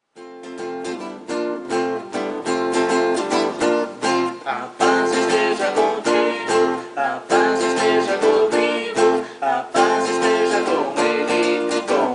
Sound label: vocal